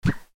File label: Woosh Mono Whistle; Frogsong